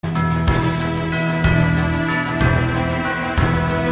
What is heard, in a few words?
Christmas Orchestra